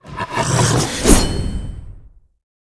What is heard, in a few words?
Wolf atk; Seer; GamigoNA